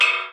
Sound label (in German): metallklang; klang im schnee